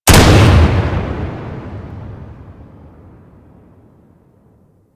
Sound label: Gunblade Shot 3D, Weapons